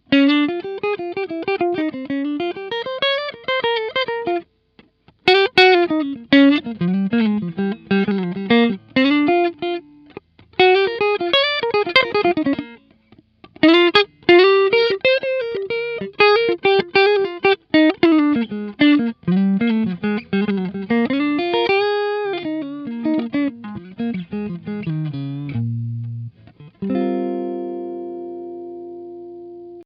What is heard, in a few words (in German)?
Maybach Teleman T61 Hals clean